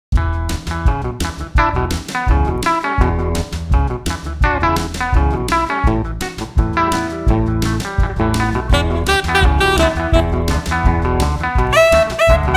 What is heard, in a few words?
Blues, orchestre